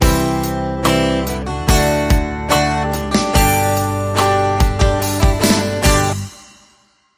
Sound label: Another Rock Ballad, Sting